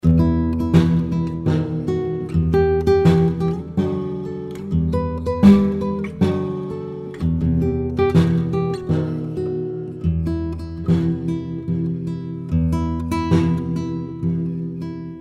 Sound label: Nice Noise Snared